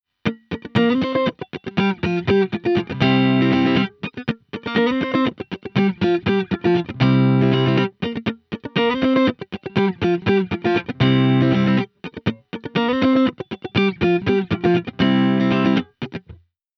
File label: comparing eg; rme